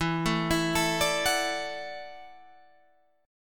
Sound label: E 9th Suspended 4th E down Guitar Standard; E9sus4 arpeggiated; JGuitar